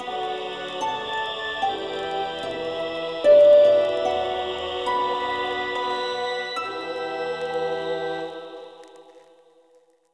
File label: melody b loop